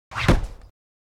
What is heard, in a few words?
Hits, Hong Kong Action Kit; NoizBoy; Hit Kit #2